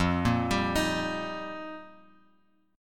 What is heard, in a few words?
F 9th Suspended 4th, Bass Standard; arpeggiated; JGuitar